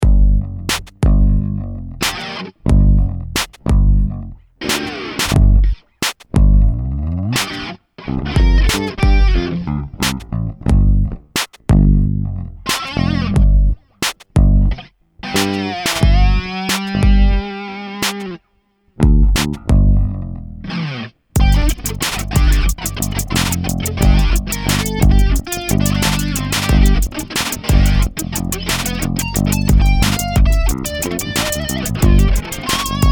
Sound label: scratchy